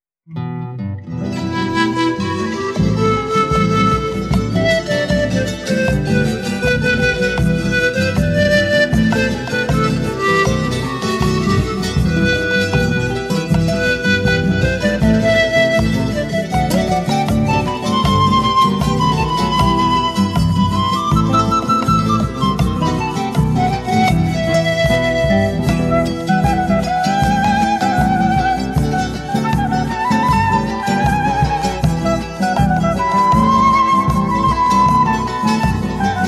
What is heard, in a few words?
Bolivian Rhythm and Dance, Pan flute music